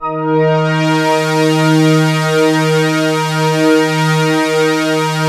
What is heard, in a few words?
Matrix 12 wall Eb4, vintage keyboards; Big Fish Audio - First Call Vintage Keyboards